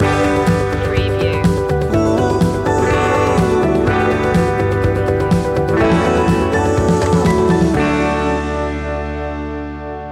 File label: starting something new